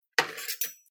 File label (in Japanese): 包丁を取り出す音